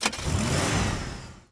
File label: vehicle classic ignition; teardown ps5 windows xbox series xs gamerip 2022